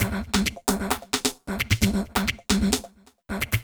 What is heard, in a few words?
Vox Perc, Dance Beats